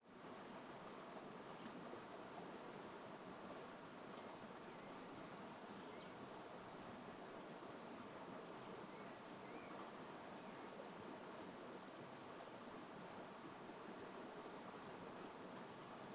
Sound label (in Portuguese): Rio mais longe passaros mata; agua